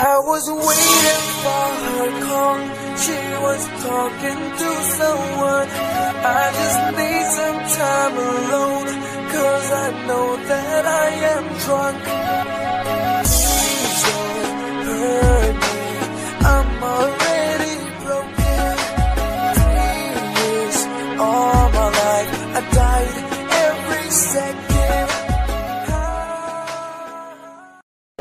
english orignal ringtone

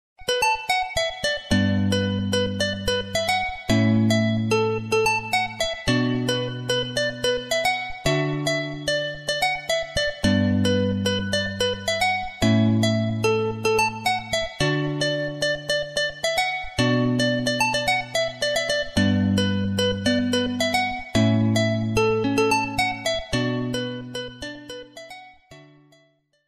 english romantic background music tone

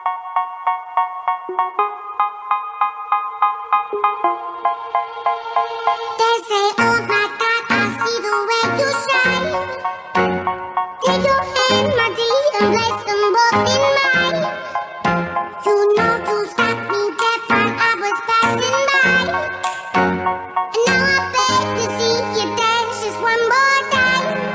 cartoon music ringtone

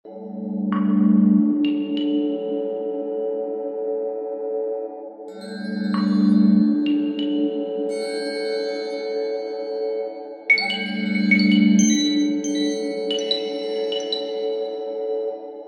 rolling ringtone, nokia

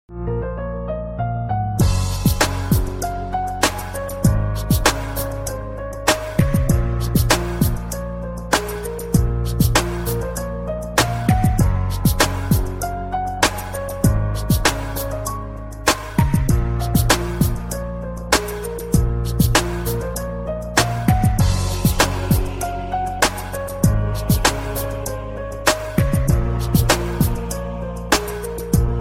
love beat ringtone

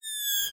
night vision off

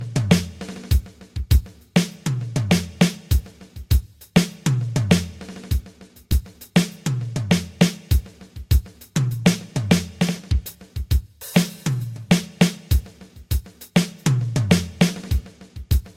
rock drums, ringtone

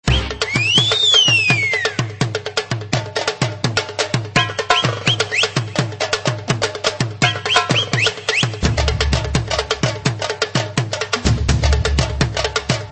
mass beat hit

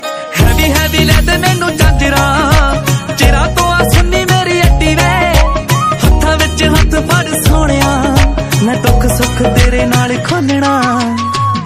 bollywood music ringtone; bollywood ringtones

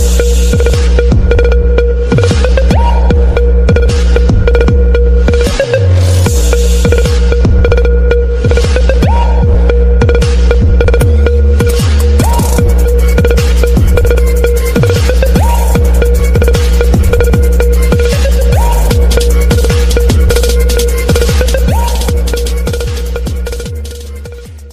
english music ringtone

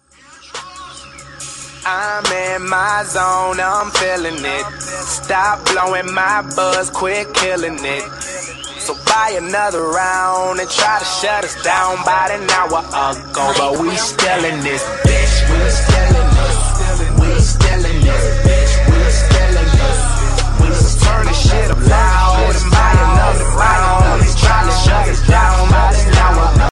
best classic ringtone

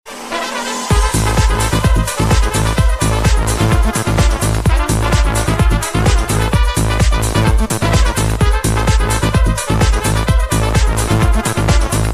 cool electro ringtone

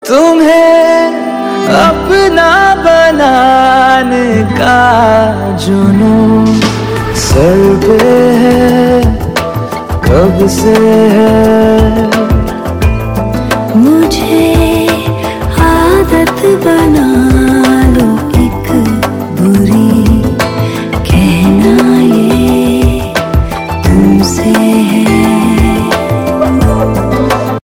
hindi romantic ringtone

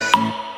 tap sms tone; iphone

